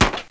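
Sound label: gunshot; Total Chaos Nukem 3d Pack; duke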